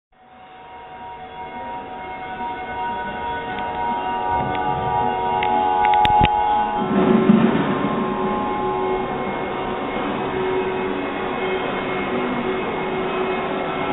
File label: sounding